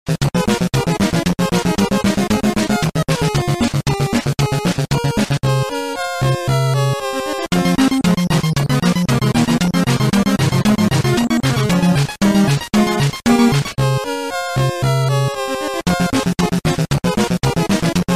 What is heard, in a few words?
remix ringtone